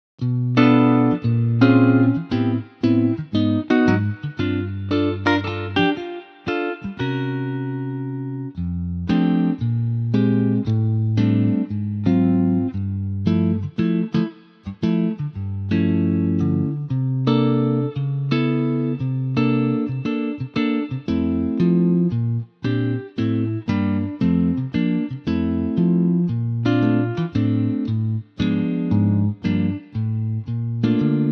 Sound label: F Sunburst Gtr, male